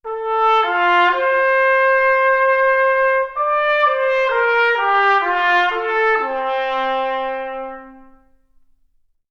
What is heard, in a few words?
trumpet